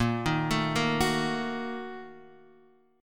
A sharp Major 7th Suspended 4th Sharp 5th, Guitar, DADGAD